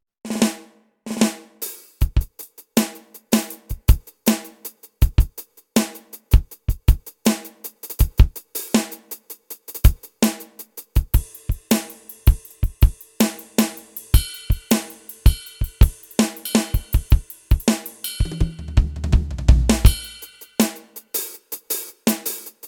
ROLAND SPD-20 PRO Studio Drum Kit playing with pedals